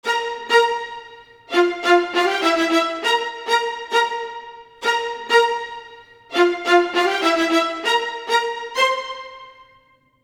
100 strings hi, Orchestral arrangements, 100 f min